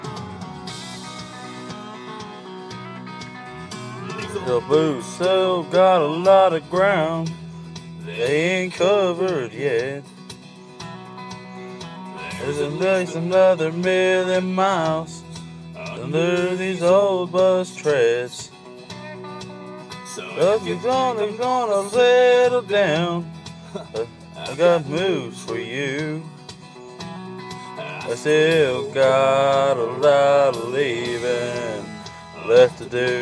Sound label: singing along